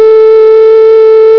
PHONE

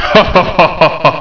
MAN LAUGH

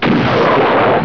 ROCKET; psion cd vol1

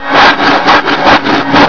SAW; psion cd vol1

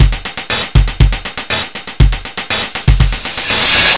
Percussion

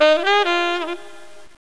SAX

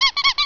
Pressure Nose

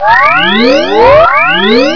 SIREN; psion cd vol1

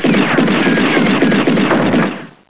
WESTERN; psion cd vol1

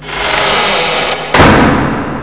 Open Close Old Door

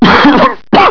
COUGH; psion cd vol1

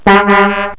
FOGHORN; psion cd vol1

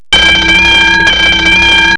CLOCK; psion cd vol1

